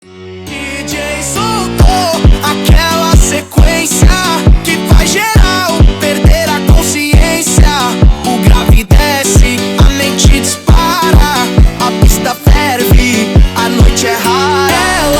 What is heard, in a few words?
phonk